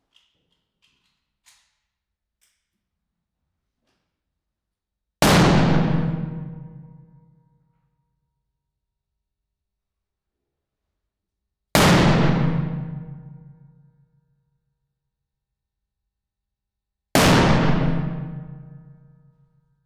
Stairwell Colt M1911 .45 ACP Pistol 6m Single Shot; MKH40; Audiobeast; WEAPONS RECORDING PRIMER